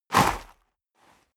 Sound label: step boots gravel light jump